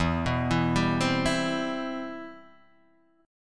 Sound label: C Major 7th, E, guitar chord